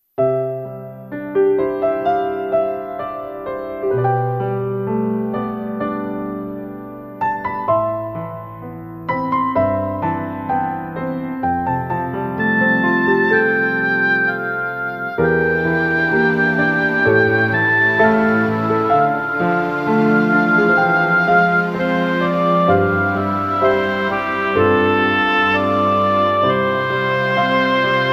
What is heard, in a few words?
ballad, piano